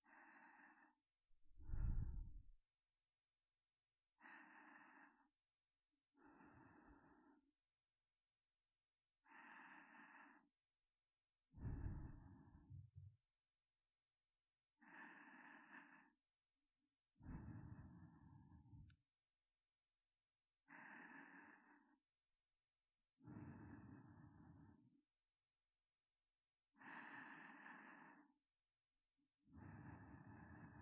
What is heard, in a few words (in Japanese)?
耳かき 右耳